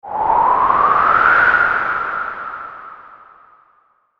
風-孤独感

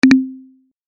確認

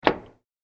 鍵 を かける; ボタン・システム; システム音

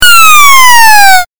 8bit 落下

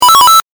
8bit 獲得

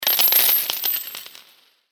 小銭をばらまいた音

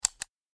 カウンターでカウント単発

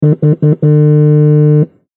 携帯電話バイブレーション

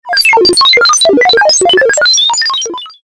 ピコピコ メカ ノイズ 長

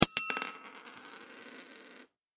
お金転がる

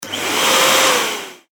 掃除機 短

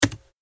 タイピング パンタグラフ

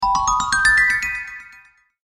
チェレスタグリッサンド

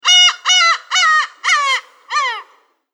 ウミネコ

